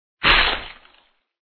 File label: sharkman attack contact bottom